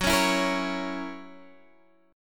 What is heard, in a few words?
Gb 7th Gb down Guitar Half Step Down, chord